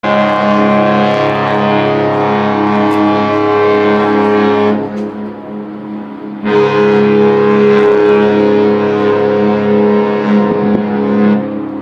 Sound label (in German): Schiffshorn, ringetone